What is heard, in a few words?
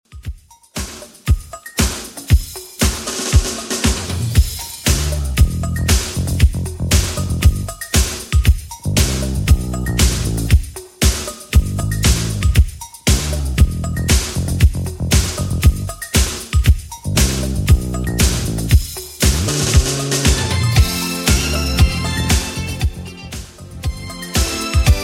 80s Redrum 117bpm